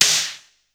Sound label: SN, Casio CTK-5000